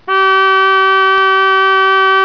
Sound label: nautical